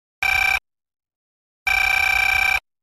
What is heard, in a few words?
telephone